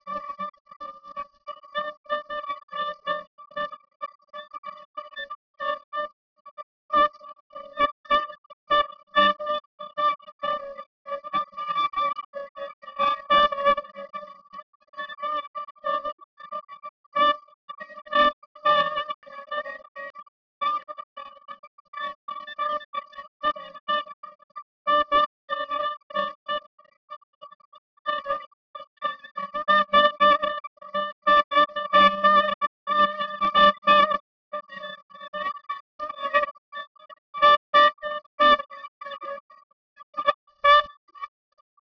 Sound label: Jazz